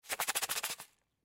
Beads Shake Package; Foley; SoundEffects-Blastwave

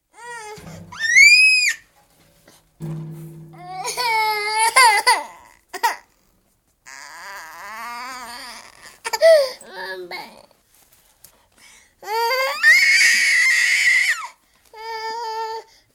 12 month old baby boy, screaming temper; Baby Sound Effects; Babies (Sound Effects:Voice); BBC

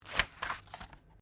Book Page Turn; Book movement; Books (Sound Effects:Offices)

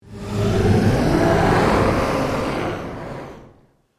Ghost Alien Roar, Ethereal; Horror; Ghost Sound Effects

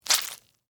Squish Orange, Texture, Squish, Orange, Fruit; Squishes; Objects; BlastWave

